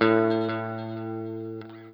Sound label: NOODLE LONG, Guitar & Bass; Quantum Leap; 90's Sample CDs